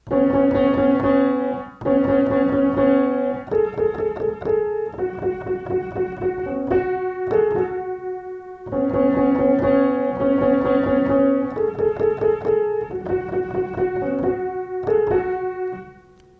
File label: ringtone